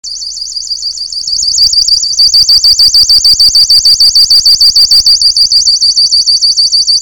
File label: bell